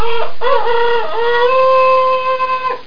rooster

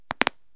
Crack Neck; Human

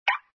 Auto Fire; yeti; Puzzle

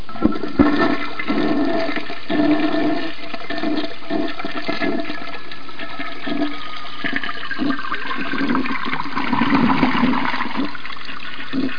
toilet

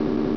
engine, tank

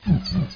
start

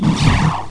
shot

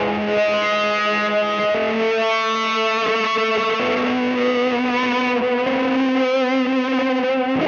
ELEC GUIT ETHNO 125, Guitar; eJay

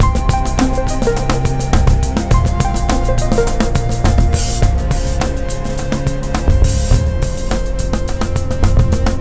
loop; shogo; interface

